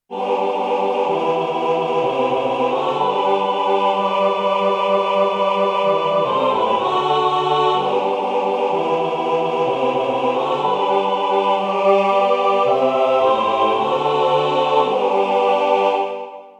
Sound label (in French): Alleluia malgache tutti, Chants